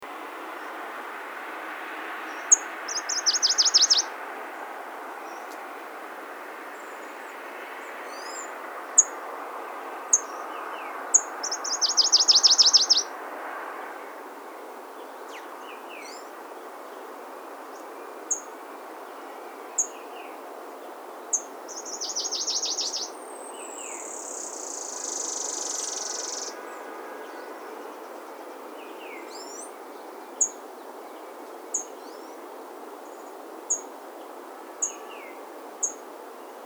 Buff barred Warbler Phylloscopus pulcher

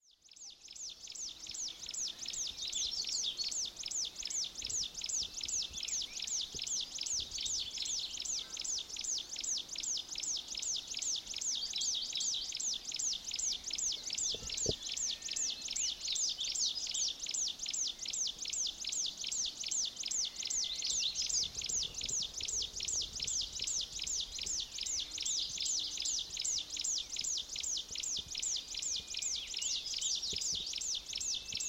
Chinese Leaf Warbler Phylloscopus yunnanensis

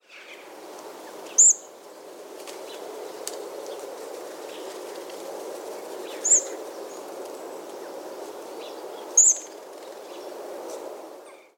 Hawfinch Coccothraustes coccothraustes

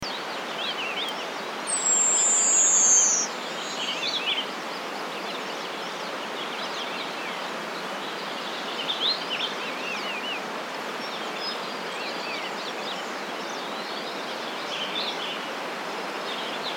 Humes Leaf Warbler Phylloscopus humei mandellii

